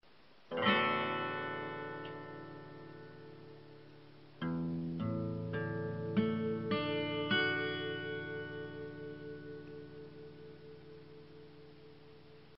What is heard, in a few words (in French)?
Accord D